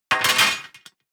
DICE on Metal, Throw and Roll, Standard, 6 Six Dice; Samples; ArticulatedSounds; DICE Sfx Party (Extended)